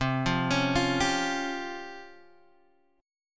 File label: C sharp Major 7th Flat 5th C